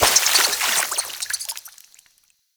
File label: Infested Flower Burst; Ambience; Props; Warframe; Nineteen Ninety Nine